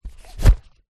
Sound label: item place inside suitcase lid compartment; foley